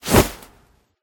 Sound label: Yeet Foley; fortnite emotes